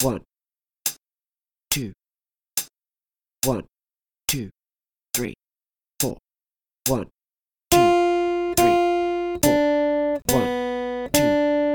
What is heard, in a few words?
3 strings, guitar